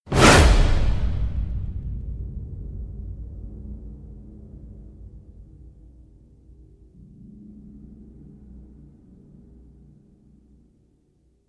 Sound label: thump